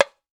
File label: TAMBORIM, Latin Hand Perc; E-MU Producer Series Vol. 2; More Studio Essentials; Composer